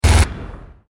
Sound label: Gatling Shot; gamerip; blockman go